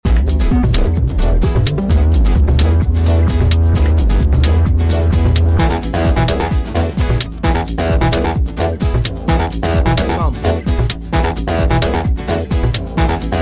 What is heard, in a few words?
electronic guitar tempo